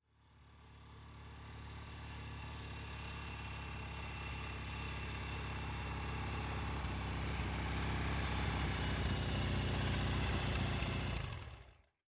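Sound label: Motorcycle, Norton, In Slow, Stop Close Up, Off.; Stop Cu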